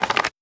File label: archer foley bow handling; Aragami